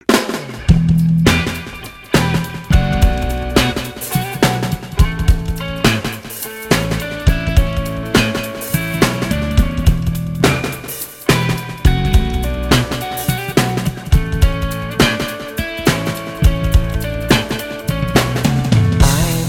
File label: delay